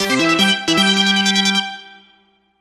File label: Sonic Mini Fanfare